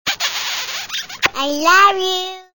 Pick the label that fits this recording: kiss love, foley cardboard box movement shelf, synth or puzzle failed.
kiss love